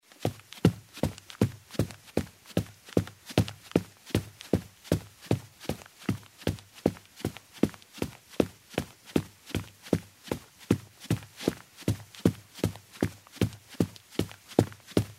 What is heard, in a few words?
Running in shoes on a carpeted wooden floor; steps